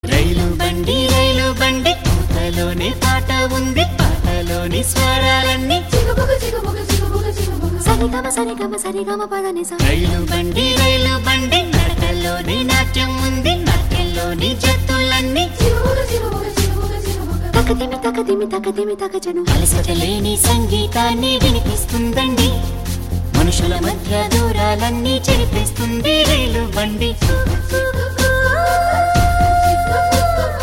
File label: Telugu Ringtone